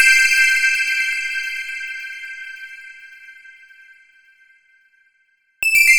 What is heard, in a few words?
Waterdrop; Сэмпл синтезатора (Ambient)